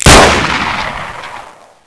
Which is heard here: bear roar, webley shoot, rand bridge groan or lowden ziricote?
webley shoot